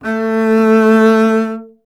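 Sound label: string contrabass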